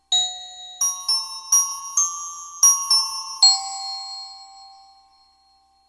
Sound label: Glockenspiel; speed 0.56; tsmtoolbox